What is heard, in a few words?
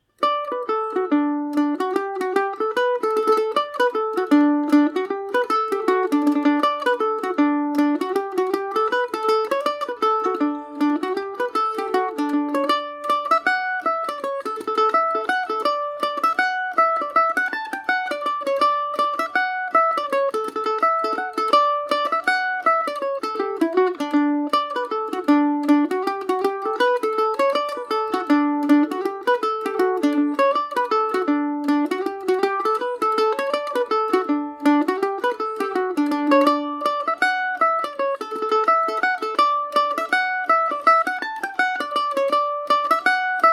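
D major paris swing, irish mandolin; limerick lads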